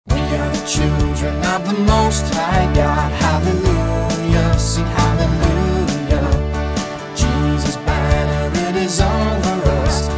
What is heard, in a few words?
vocal